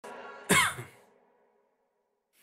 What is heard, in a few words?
cough